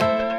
Chord Climber; Lost Tapes From Mars

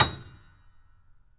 Mf A#6, Grand Piano; AKAI S6000 CD-ROM - Volume 2; Grand Piano 256M

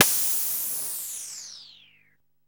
NOISE BLOCK, synth perc; Zero-G; Ambient 1

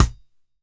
TING LP

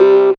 synth, Dance Synths Trancers; ProSamples vol.37; Best Service

